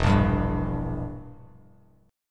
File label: E Diminished E, Bass Standard; Edim strummed; JGuitar